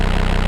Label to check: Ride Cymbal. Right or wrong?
Wrong